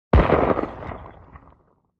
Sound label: WEAPONS - SHOTGUN PUMP ACTION: EXT:; shots exterior